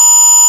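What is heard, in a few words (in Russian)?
неправильно считанный ключ доступа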